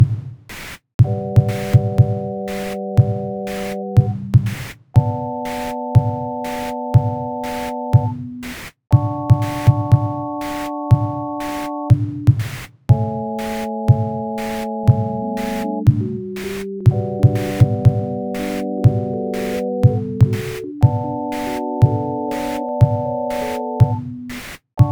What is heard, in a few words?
Sinewave